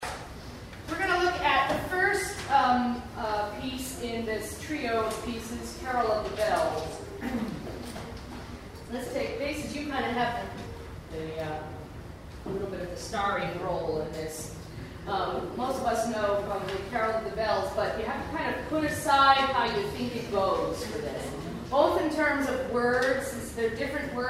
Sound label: christmas jazz